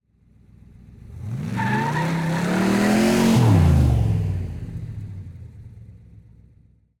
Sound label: bigblock corvette 1967 driving burnout forward squeal; 6ft height ortf; fvtt sonniss gdc audio